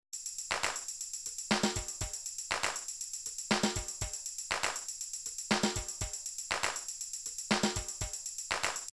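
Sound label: drums pattern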